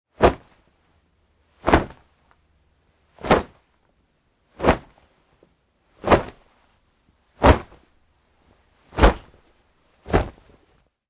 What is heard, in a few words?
Foley Fight Moves Jeans Singles Hard; Wrestling And Struggling